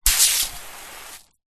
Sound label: industrial pressure washer squirt power off short; zapsplat